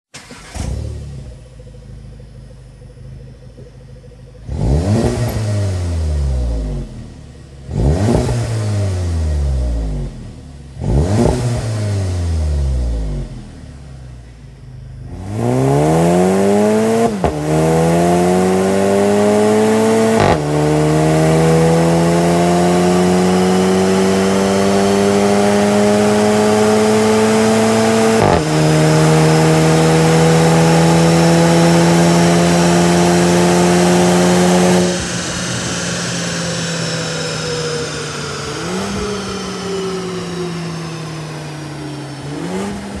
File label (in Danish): VW Golf GTI MK VII Akrapovic Slip on Line Titanium lyd; Akraprovic